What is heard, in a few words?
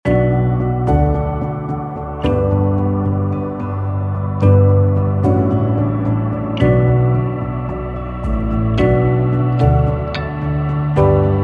velvet sky lofi ambient